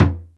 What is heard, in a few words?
Mute surd, Roland MC-303 Percussion; Drum Samples